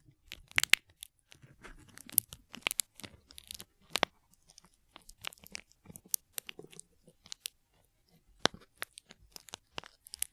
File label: Paper